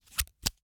Hwatu, card game, hard plastic, small, clatter, pull, slap, throw down, single card, medium; Eiravaein Works; Vaeyan IV